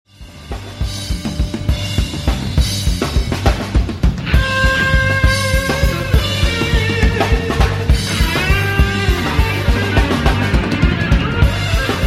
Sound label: flying harp bolero; glassharp